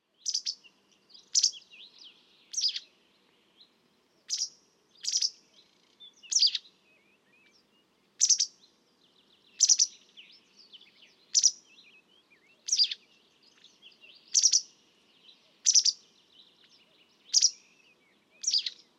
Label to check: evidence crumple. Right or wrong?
Wrong